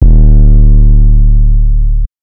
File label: Not Nice 808's